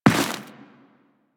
Run Dirt, Footsteps; Warframe; Teshin

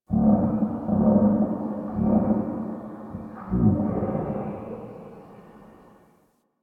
Creature; Warframe; Quests; TheNewWar; Ambience; Drifter Camp; Creatures

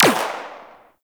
Rifle Fire; Warframe; Venus; Venus Flying Nullifier

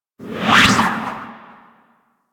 Grapple Pull; Warframe; Warframes

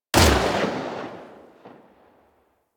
Burst Rifle Shot Secondary; Weapons; Grineer

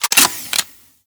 Heat Gun Reload; Grineer

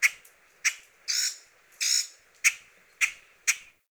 Tree Birch Bird; Trees

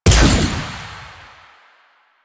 Fire Shot

